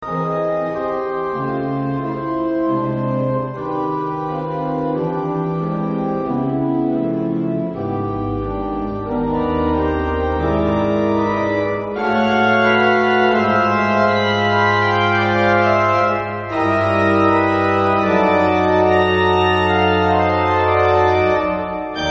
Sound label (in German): psalm37 orgel